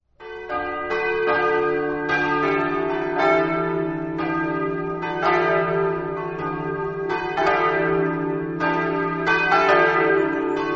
flambro, campane

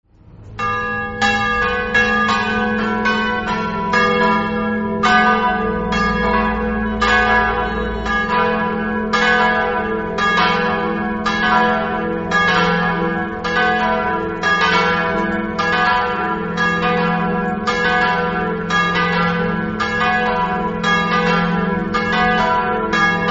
virco, campane

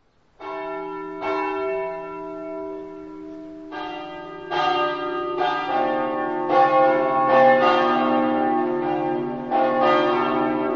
sampier, campane

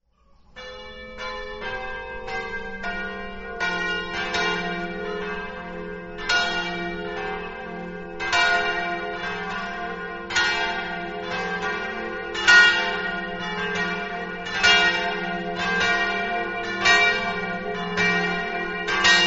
manzinello, campane

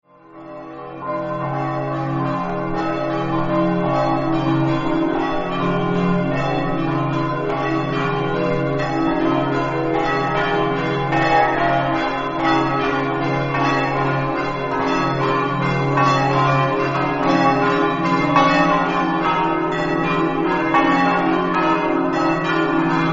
mossa, campane

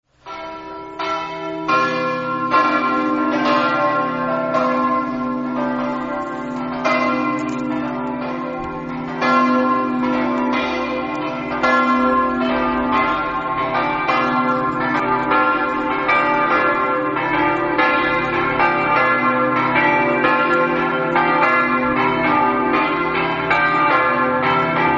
prema, campane

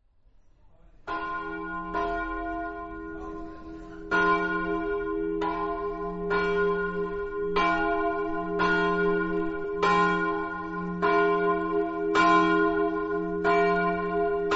mossa, campane

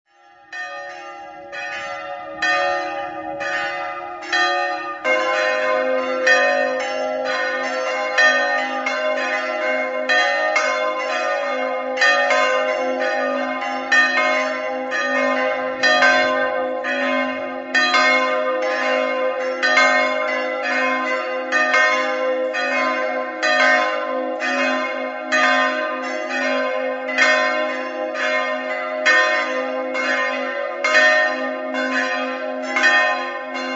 campane, quarin

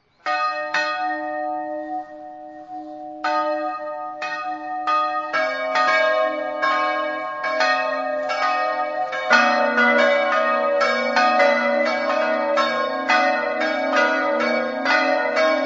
fanna, campane

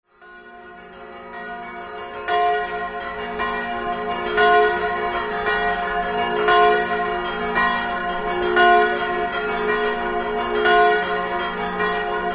dolegna, campane

